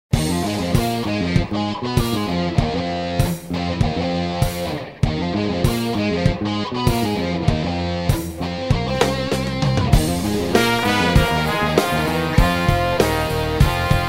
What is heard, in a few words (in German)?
trompetentaxi